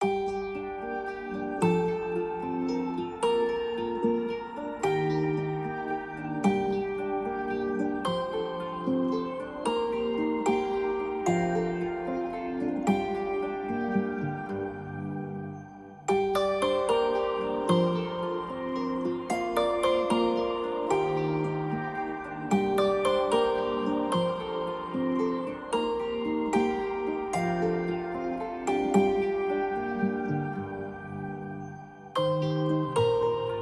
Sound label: Lyre